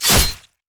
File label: basic attack sword; rengar